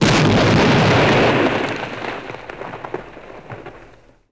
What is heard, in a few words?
explo med gravel; impacts explos